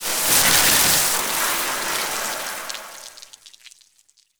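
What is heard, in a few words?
water pipe burst decay; Explosions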